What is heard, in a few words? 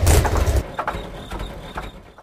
stop, junker, vehicles; video games; source engine; ep2